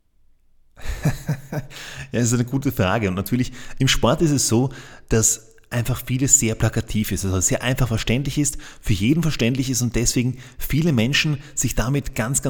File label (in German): applaus